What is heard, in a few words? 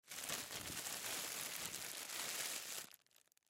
Plastic Wrap Move, Plastic Wrap Movement Crumple; Materials; Household; Blastwave